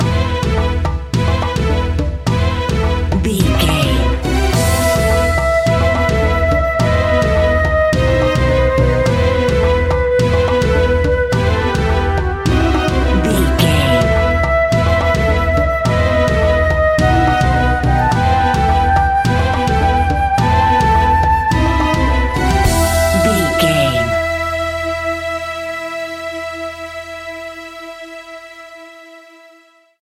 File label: indian music bed, An exotic and colorful piece of World music. Groovy, powerful, exotic and wild.